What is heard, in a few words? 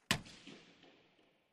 Hockey Puck Hits